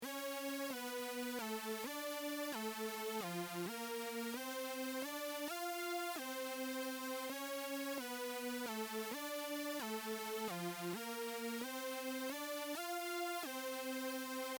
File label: synth